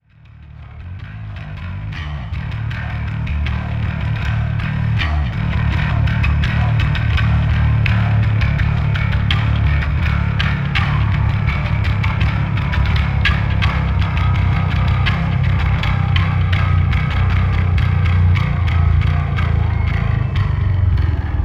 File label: Bass Solo